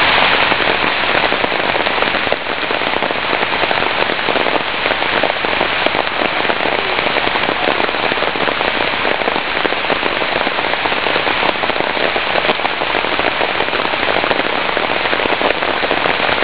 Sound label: rainfall; costarica